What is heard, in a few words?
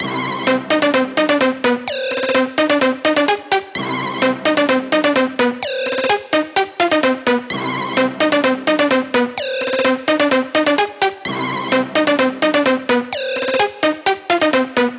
Big Room Loop, 128 BPM C; Synths; Loops; Drop Loops; Cymatics; EDM Starter Pack